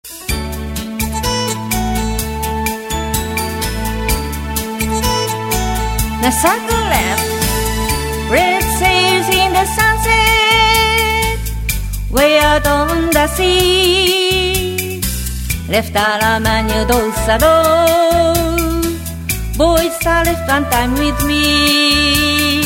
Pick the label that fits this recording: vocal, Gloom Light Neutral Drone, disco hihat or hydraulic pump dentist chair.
vocal